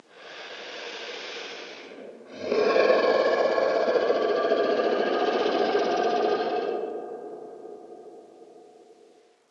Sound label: monster; Daily Chthonicle